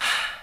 Chant

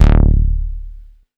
Bass Juno D; Vengeance Samples

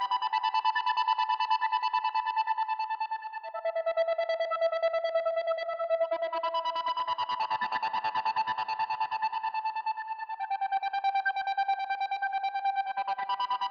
Whistle Synth WET 140BPM, E minor; Melody & Synth; Vengeance Samples

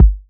Kick; Street Anthems Sound Kit

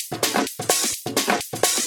Percussive Loops 128BPM; Vengeance Samples

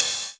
Ride, Cymbals; Vengeance Samples

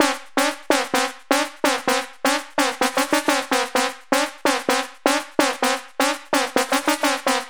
Crazy Chip Melody 3, 128BPM; Vengeance Samples

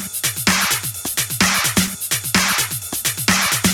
Minimal Loops

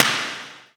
Percussion; Vengeance Samples; VEH2

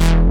Synth Shot; Vengeance Samples

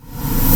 Sweeps Short

